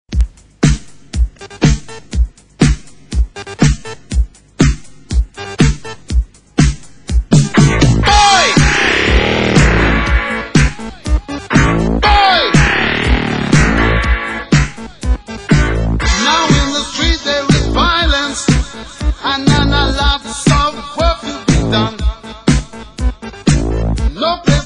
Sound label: electric